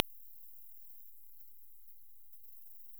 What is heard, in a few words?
Kerivoula papuensis